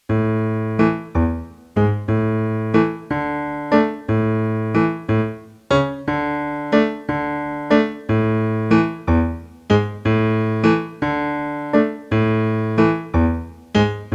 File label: Chromatic bass line Left Hand Only; Road to Skye